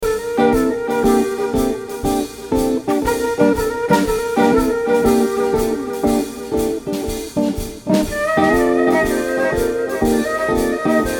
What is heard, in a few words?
Jazzy 120 bpm